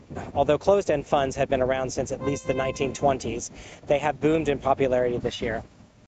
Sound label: real str